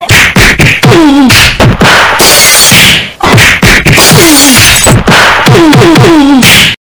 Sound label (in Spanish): golpe; fortnite emotes